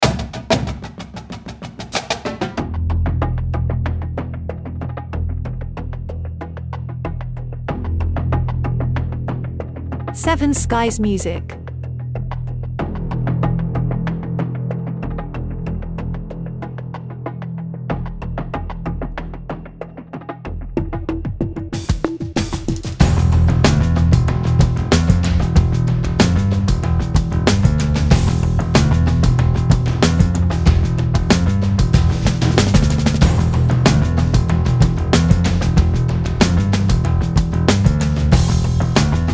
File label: Combat Ready Drums, Bass and Drone Only Mix